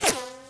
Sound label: whizz; Mafie 1